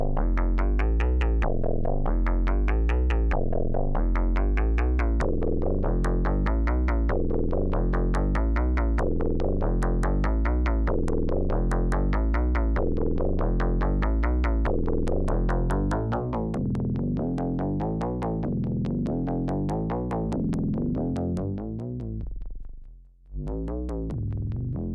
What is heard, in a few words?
cre8audio West Pest Sequence